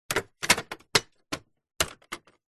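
cash register electronic paper roll plastic mechanism movement open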